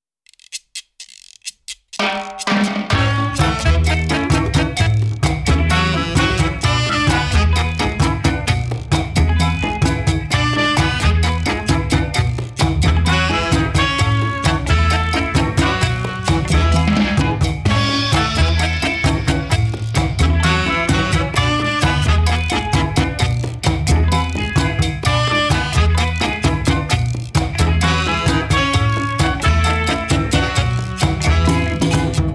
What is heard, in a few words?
Rumba Mambo Cha Cha Cha